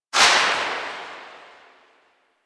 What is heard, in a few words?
Pistol Silenced Tail; Weapons; Warframe; Drifter; Operators